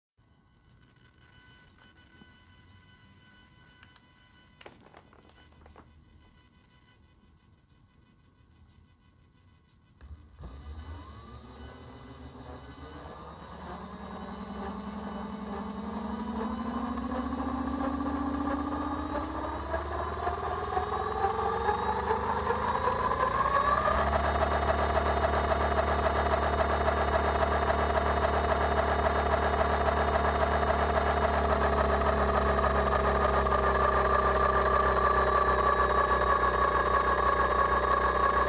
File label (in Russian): zvuk motor ciatim, fatbike